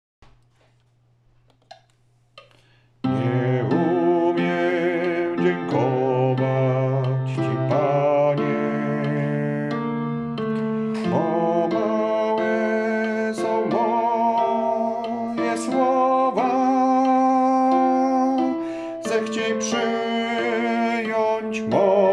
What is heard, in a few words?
bas